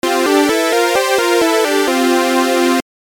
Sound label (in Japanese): ピッチ 7 デチューン ピッチ 5